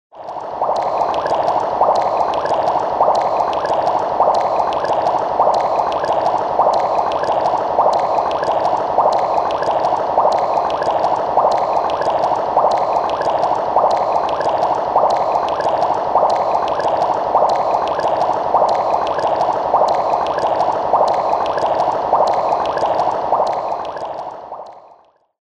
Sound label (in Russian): zvuki vedmy